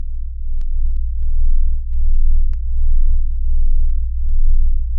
silence underneath

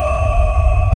powerful explosion

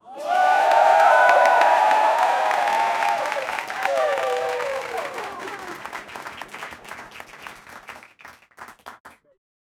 studio audience erupts in, applause

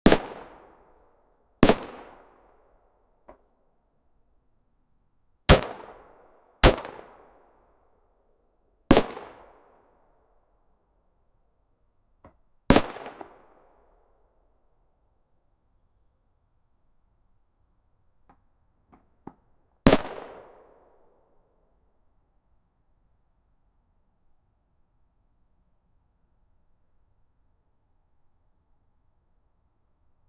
soft distant gunshot

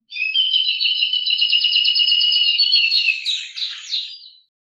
giant bird screech

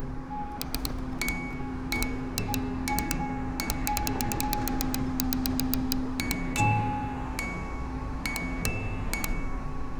an environment with an ov

